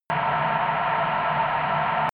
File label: drone spaceship vent hard